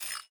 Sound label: shears